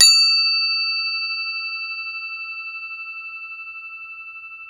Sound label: CROTALE E5, Crotales